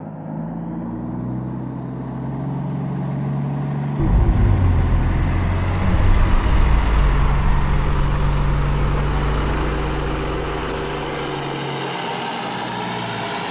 convoy pass

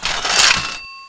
buy

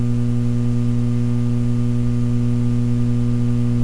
fluor light

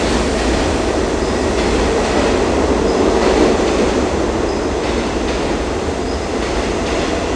subway

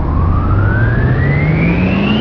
robo fire windup; robolaser

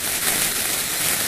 fuse; ragemap2024; grunt

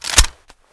rifle cock forward

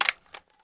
mag out wet, colt 727

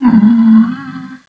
pain

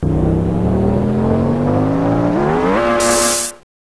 shock

